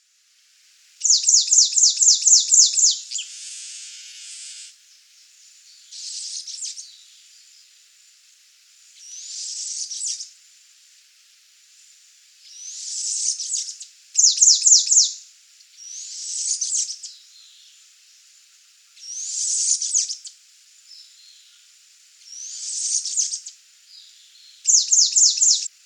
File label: Lesser Antillean Bullfinch; Guadeloupe; chants des oiseaux